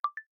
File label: usb effect; Tecno Spark Go 2023; ui